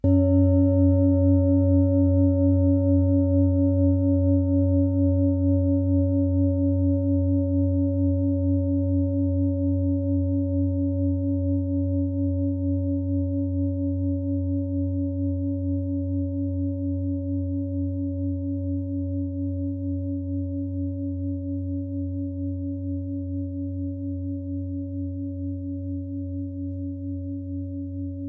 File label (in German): klangschale set; Klangschalen-Chakraset; Gewicht = 2400g; Durchmesser = 29,5cm; Höhe = 12,8cm